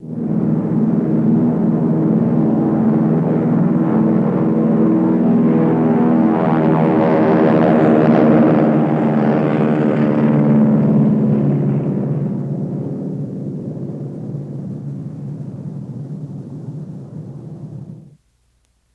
B29 Bomber Multi By X2, b 29 superfortress

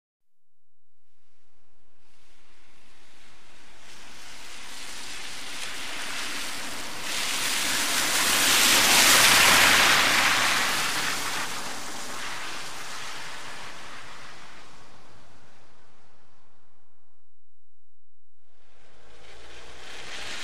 Wet Bys Fast By Puddle, wet traffic

